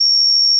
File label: PeakSlope D2